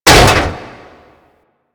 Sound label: Frame Step; DE; Warframe; Vay Hek